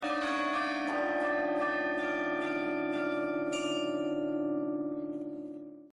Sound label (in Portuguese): carrilhão mini; instrumentos